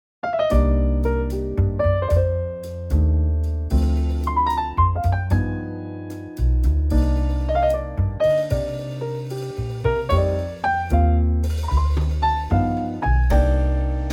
Bb trio; Real Book changes and key; vocal range - Eb to Eb; Lovely Trio arrangement; 3 times through in total

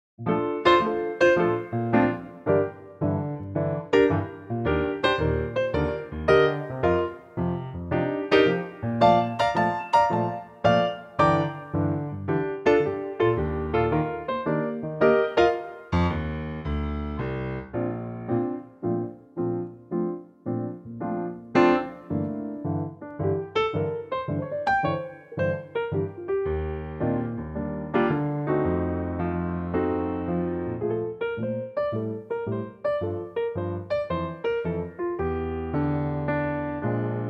Bb piano; key - Bb - vocal range - F to F; Infectious swing arrangement is the key to this piano only arrangement; Pianotrak version 2; Infectious swing arrangement is the key to this piano only arrangement and it works great at this tempo.

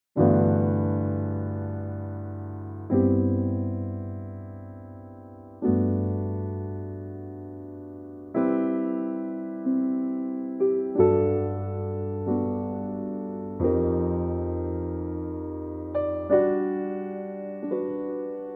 G piano

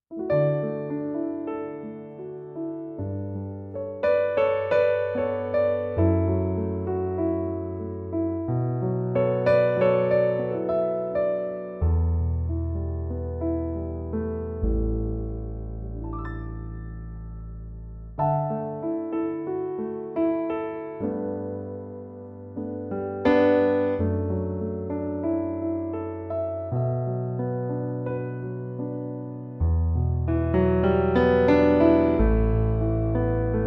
piano D; key - D - vocal range - A to G

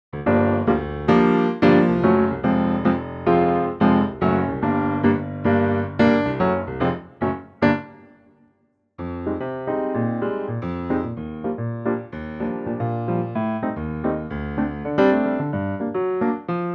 piano trak Gm; key - Gm - vocal range - D to Eb; Very cool piano only arrangement; latin bass line pushes

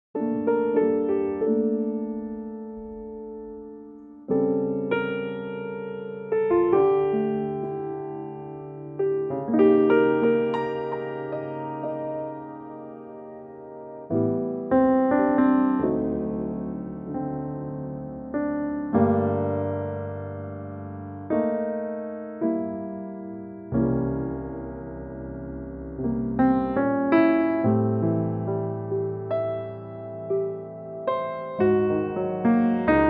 piano; key - F; vocal range - Bb to C

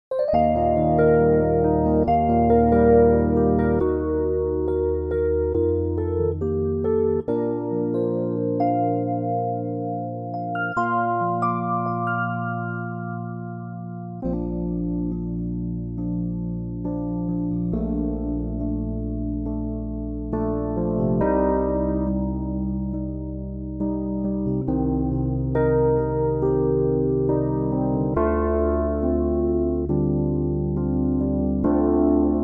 Arturia EP; Pianotrak; key - Bb - vocal range - G to G (optional falsettos).

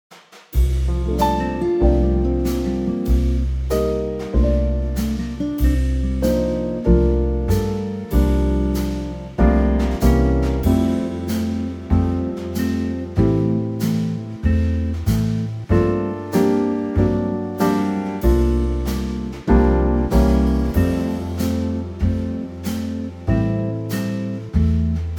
F Trio

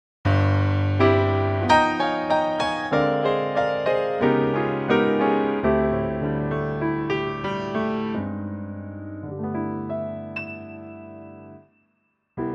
C trio

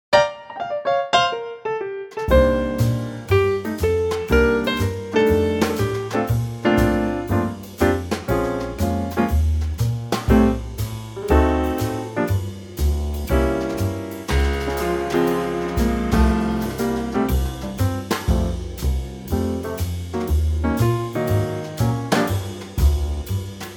F Trio

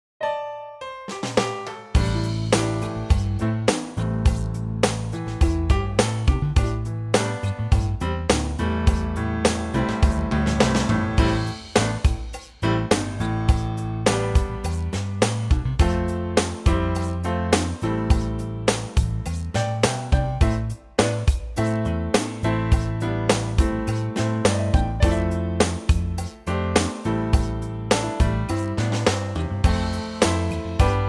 trio C

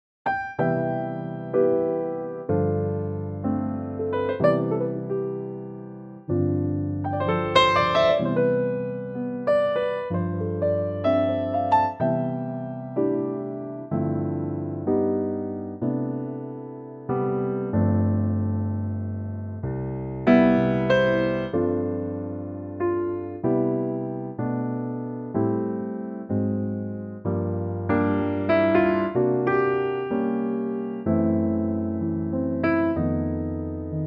C piano

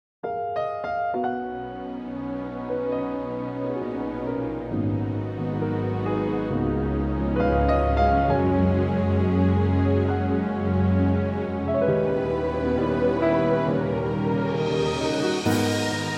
G orchestral; key - Gb to Ab - range - Gb to Eb

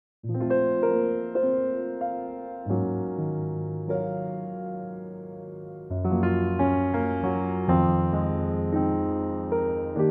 Bb piano; Pianotrak; key - Bb - vocal range - D to D

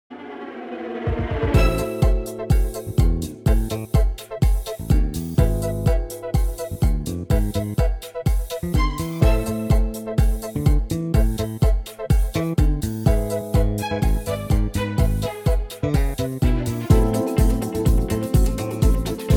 smooth C; key - C - vocal range - B to C; Superbly fresh and punchy smooth arrangement of this Latin classic.

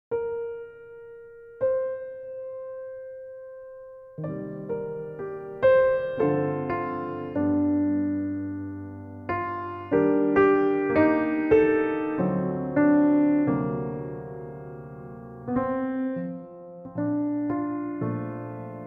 Bb piano; Pianotrak; key - Bb - vocal range - Bb to D; beautiful piano only arrangement

